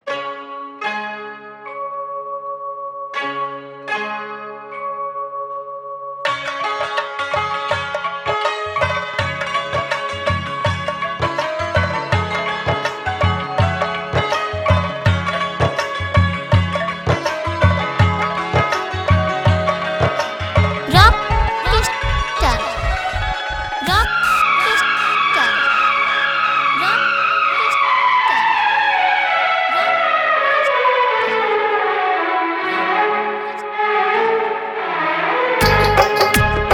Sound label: Dholki Hard Mix